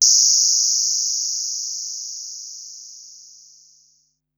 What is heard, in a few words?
Noise High, Korg Wavestation-SR Drum Kit